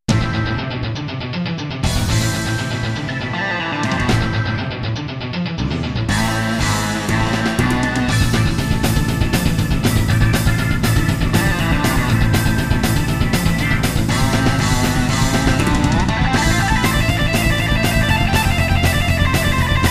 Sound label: Rock Guitar & Organ; SD-90 MIDI